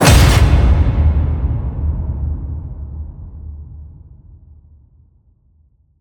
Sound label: HIT Metal Crash, Cinematic Hits Designed